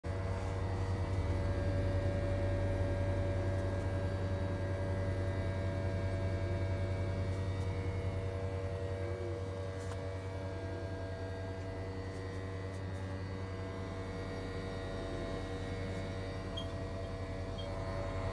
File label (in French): bruit groupe exterieur mitsubishi